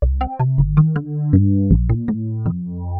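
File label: synth bass, ambient, 160, C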